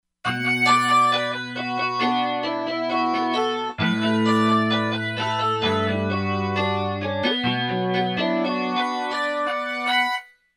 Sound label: Thick Koto, Korg MSC-13S Ethnic 2